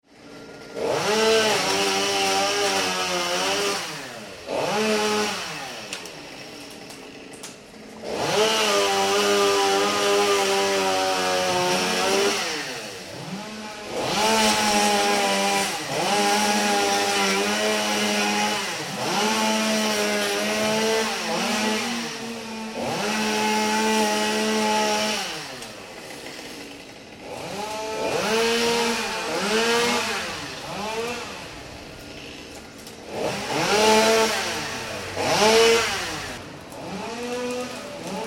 Chainsaw, cutting branches off tree - second chainsaw joins in; Tom Hutchings